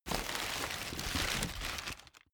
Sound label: stuffing paper take out